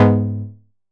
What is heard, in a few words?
stab speed, stabs